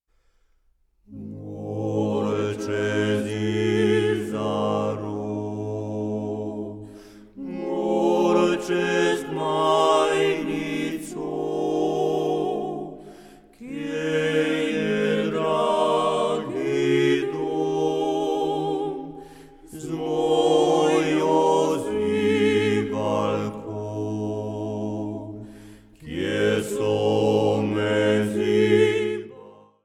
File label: acappella GorCez